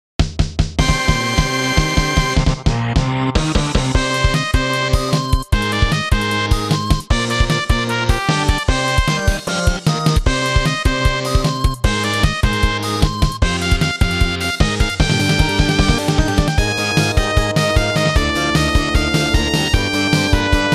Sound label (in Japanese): FF6風音色ver.